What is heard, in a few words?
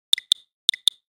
Click